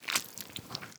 moist impact; Paradise

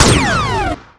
Laser

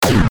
blaster

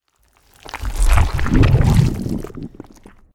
insertion

